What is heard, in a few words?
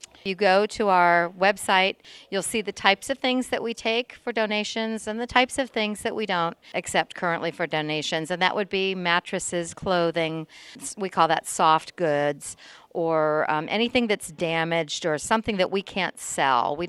melody; habitat for humanity's restore